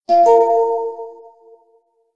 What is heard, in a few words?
hit; 10 differences2